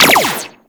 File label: Sentinel Burst Laser Pistol Fire; Warframe